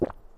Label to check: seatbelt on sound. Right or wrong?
Wrong